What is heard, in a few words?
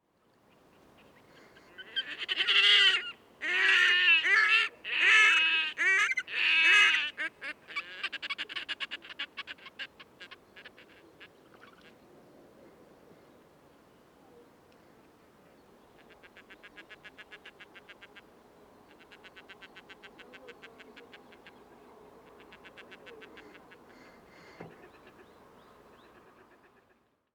Red necked grebe; كشیم گردن سرخ